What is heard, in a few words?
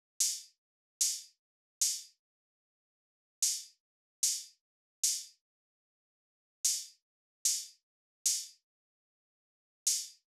Drum Loop - 149 BPM Open Hat; Loop Stems; Cymatics; DOPE DRUMS VOL. 1; Promise